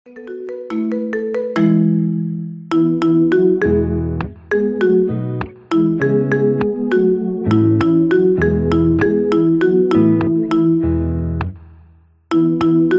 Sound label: Marimba Ringtone Remix